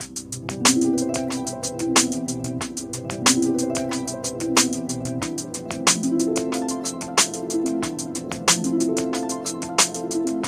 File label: dream beat